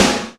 TRASHY SNA, Drum Mashines, SR-16 DRUMS; EdgeSounds

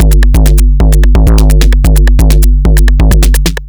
Crushed Beat 130BPM; musicradar

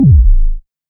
Quasimidi 309, Drums

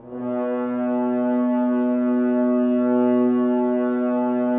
Brass F.Hrns; Sample CDs; Miroslav Vitous; Woodwind Brass Ensemble

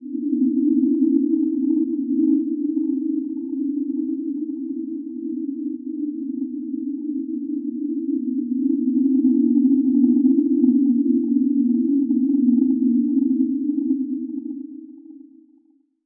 Bleak & Eerie, Dark; Zero-G Ambiosis

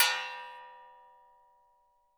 TRASHY METAL, Definitive Percussion

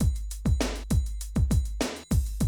drum loop, 100 BPM

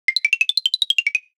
Tiptoe, Classic SFX, cartoon; musicradar; cartoon caper

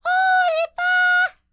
Vocal Planet World Voices, NOMADIC

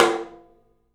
OPEN TOM; 3D Sound; 3D Drums

